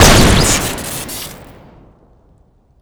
shoot crit; terrorizer; tf2classic

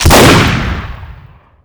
revolver shotgun; tf2classic

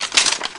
mk18 draw; tf2classic

